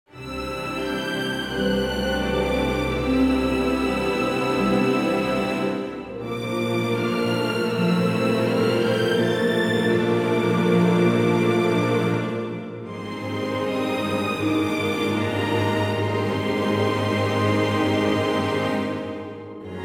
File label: C orchestra; key - C - vocal range - A to D; Stunning orchestra arrangement